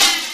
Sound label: 909 hat op